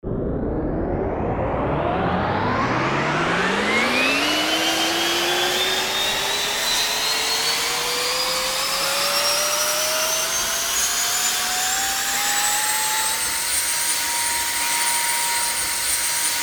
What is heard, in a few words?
startup engine element